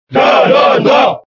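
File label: Cheer French, crowd; Ganondorf; SSBB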